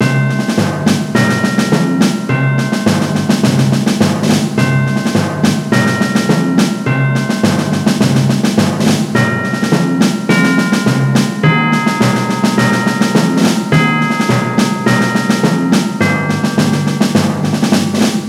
reveal music, Army Music

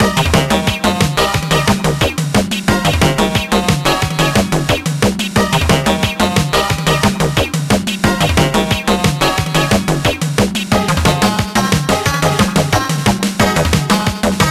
Disco; Squid Boss ~ Disco Station 9 Level 2; kim possible iii team possible 2005

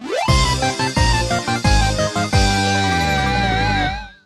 Jingle (Fail, Lose, Game Over); bomberman panic bomber; psp

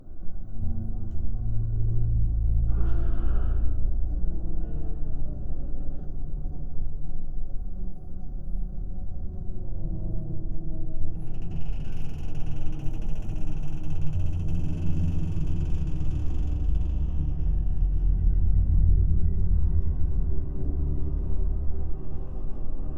Ambience Harbor; Content Warning

